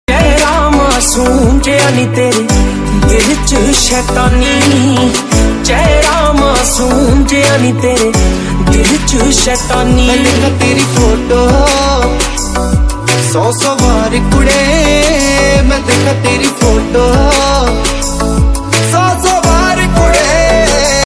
new punjabi ringtone new punjabi song ringtone punjabi love ringtone best punjabi ringtone